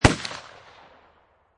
Pistol Rhuger 44 Magnum Single Shot Exterior At 45'; Gun; at 45 feet distance; Pistol Sound Effects